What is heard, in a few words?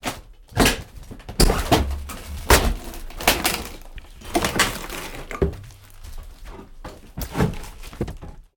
shelter fix; Original TK Sounds